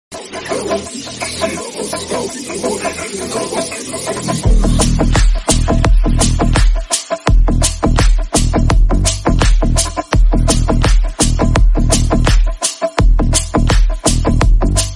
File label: boss bgm ringtone